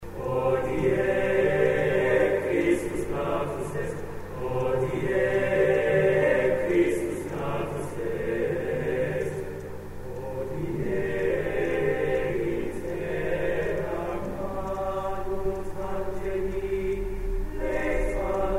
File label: Hodie Christus Natus est TTBB